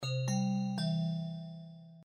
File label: notification